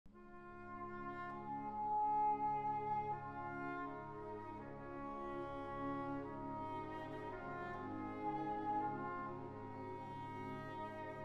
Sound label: Cor Anglais; کر آنگله